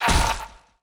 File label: bloodassassin damage